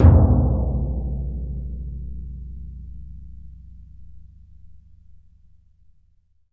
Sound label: TMP C#, Synclavier Percussion; ILIO; Synclavier Percussion 2; World & Orchestral